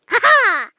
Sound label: Gnome Surprise